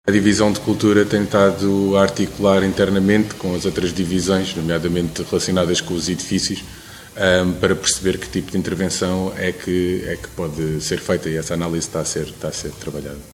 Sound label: JAZZ